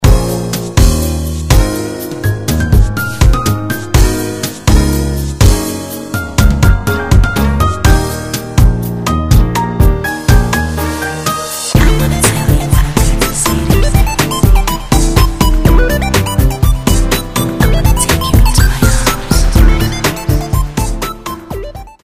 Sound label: elec bossa 123bpm; sample